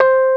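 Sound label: EP C4 FF, electric piano; Greytsounds VOL-1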